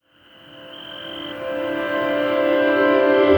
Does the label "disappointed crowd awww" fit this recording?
No